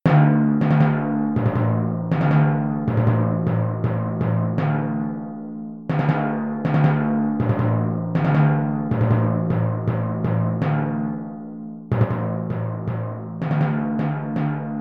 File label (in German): timpani etuede